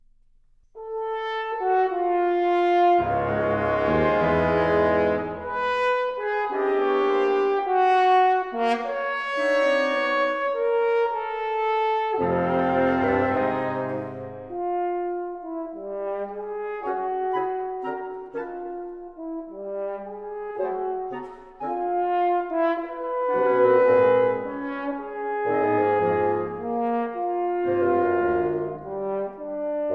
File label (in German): Concerto fur Horn und Orchester III